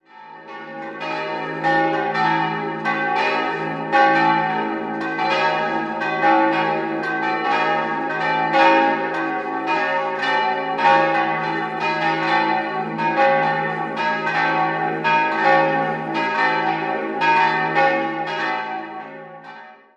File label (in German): Gars, Glocken